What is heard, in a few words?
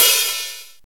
light open hat d sharp key